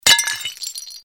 Звуки бьющегося стекла посуды бутылок окон

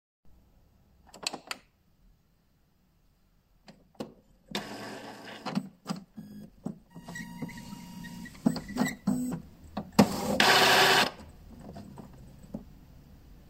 Включение струйного принтера

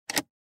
Звук выключение рубильника

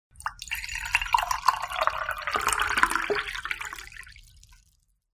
Жидкость наливают в стакан

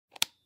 Звук механического выключателя

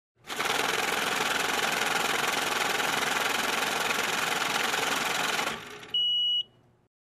Звук банкомата который отсчитывает купюры

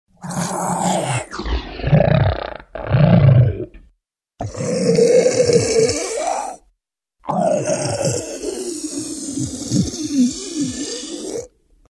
Звук велоцираптора который хочет кушать

